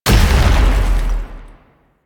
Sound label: Biped Stomp; Warframe; Man In The Wall